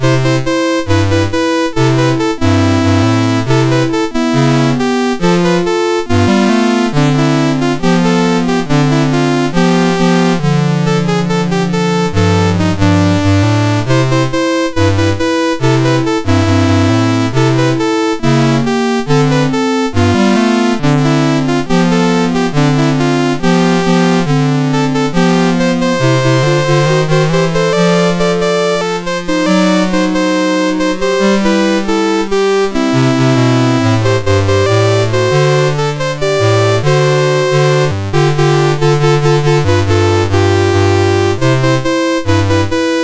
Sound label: MOS 6581 R2